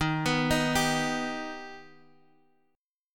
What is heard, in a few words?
Eb Augmented Eb